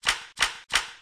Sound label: toggles off; p00gie